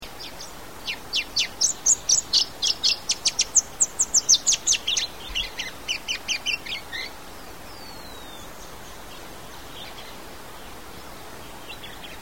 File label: bright rumped yellow finch